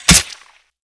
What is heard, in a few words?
shovel stab, weapons